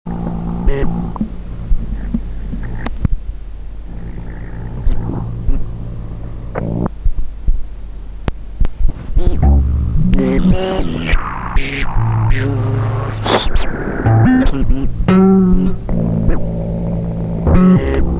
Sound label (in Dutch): Kind speelt gitaar